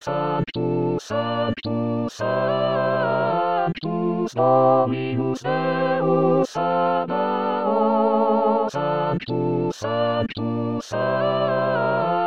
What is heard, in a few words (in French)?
sanctus messe de saint jean les 4 pupitres